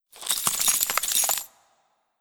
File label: Abyssalflame Whirl I C E; Legend of Keepers